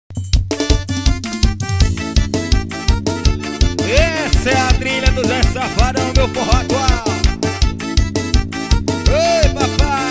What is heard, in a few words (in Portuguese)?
arrasta pé